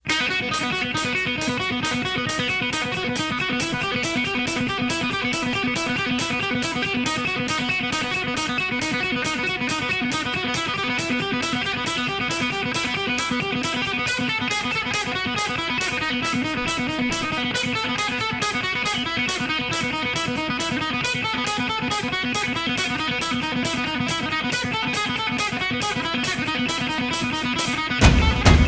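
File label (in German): Klingeltöne instrumental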